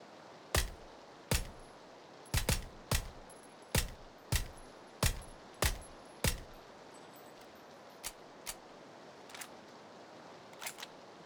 Vss single shot rain

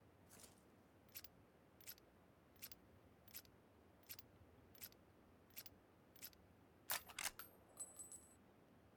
Win94 reload

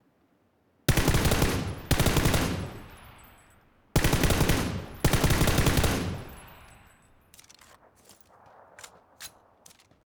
M416 full auto

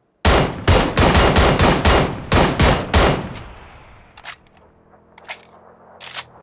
QBU single fire

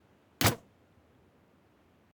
Crossbow shot

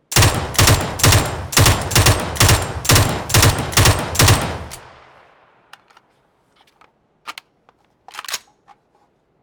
Mk47 Mutant burst fire

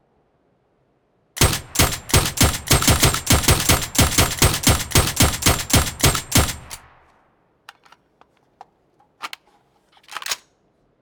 Suppressed Mk47 Mutant single fire

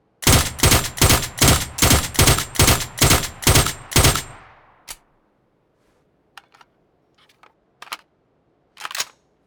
Suppressed Mk47 Mutant burst fire

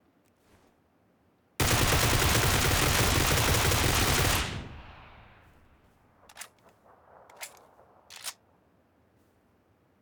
Groza full auto

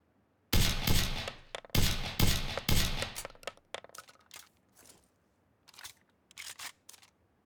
Suppressed s12k

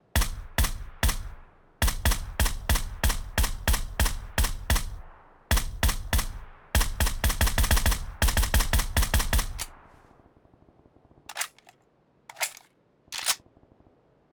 Suppressed groza single fire

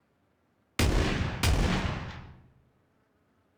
S686 shots

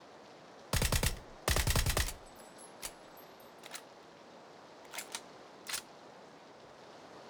Vss full auto rain

